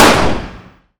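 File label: fire distant 45acp pistol interior; gmod; arccw uc commons attachments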